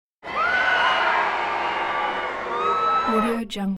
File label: wrestling crowd